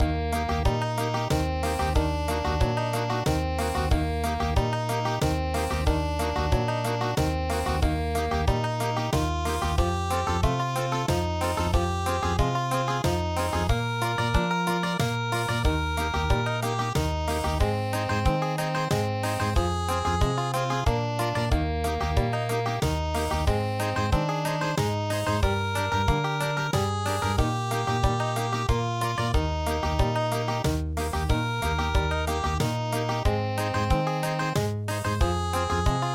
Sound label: Little Boy Blue Country